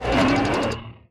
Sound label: Robot idle